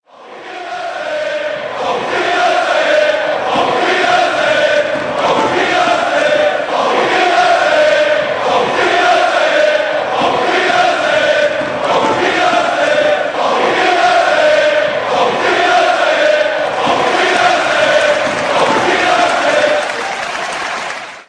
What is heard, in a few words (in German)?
Aufwiedersehn, fan chants; bayer leverkusen